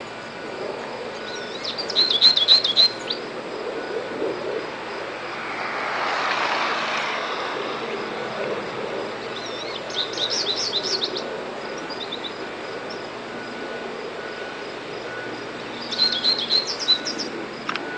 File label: bird